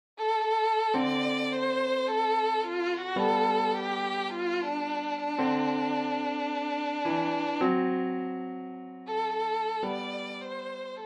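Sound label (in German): Violine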